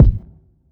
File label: open kick; Kicks